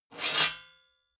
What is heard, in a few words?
metal sheet scrape; metall